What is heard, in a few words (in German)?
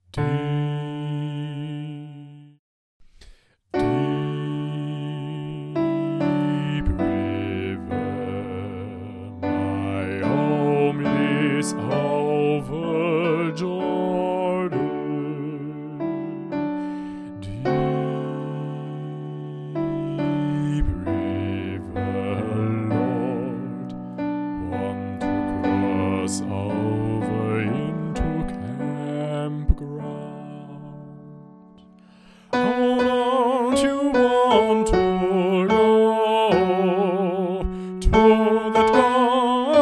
Bass mit Klavier Tutti; Deep River